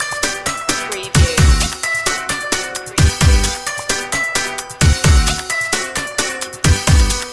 flohwalzer loop

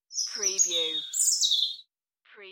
UK Birds; beanstalk audio

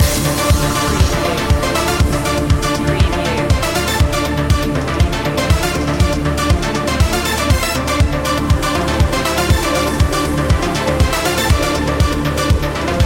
arp loop

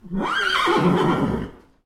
Horse neigh indoor

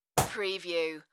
Clap Snare

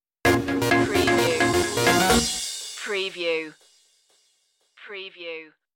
the groove is all around stinger

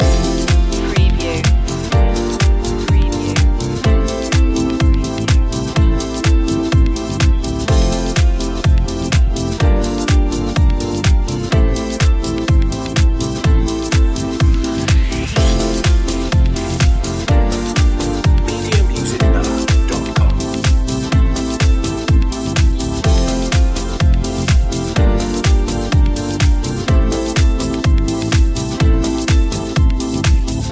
summertime beats loop

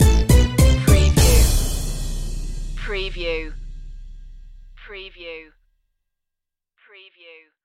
sting

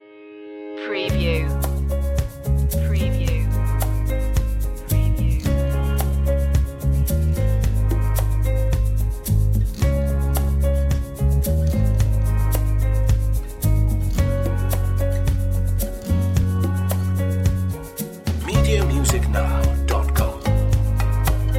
sweet tune

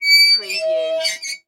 Metal Squeak

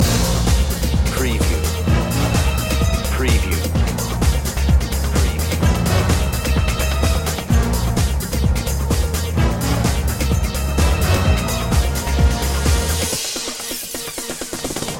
sym groove loop

